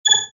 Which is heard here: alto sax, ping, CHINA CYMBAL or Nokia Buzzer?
ping